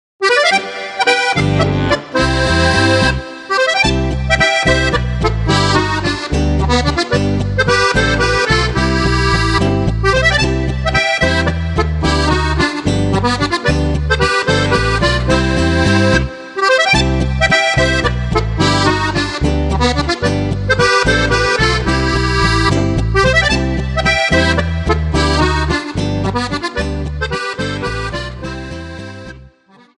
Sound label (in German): uebermutige harmonika